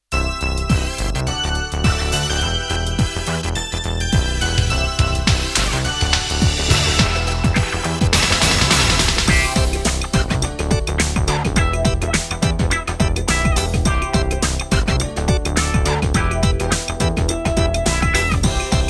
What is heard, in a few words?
karaoke